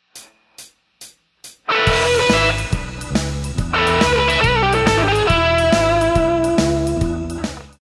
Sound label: Lick, guitar